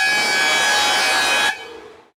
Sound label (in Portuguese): buzina